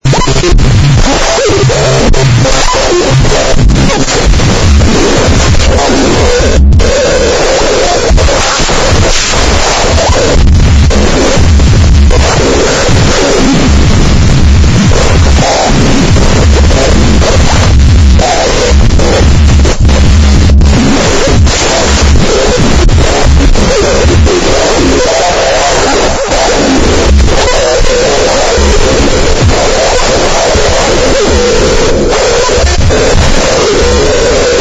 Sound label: Static Bass Amen 108